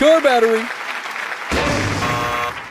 car battery; Memes

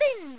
spin; superstar saga

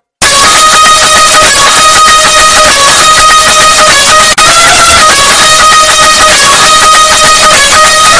BASS BOOSTED OUTRO; memes

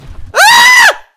scream

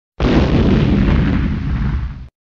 EXPLOSION SOUND EFFECT

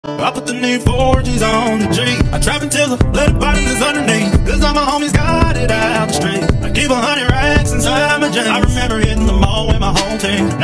COUNTRY REMIX

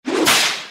WHIP SOUNDS; memes

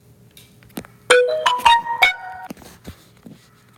SAMSUNG TEXT; memes

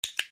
dog clicker; Memes

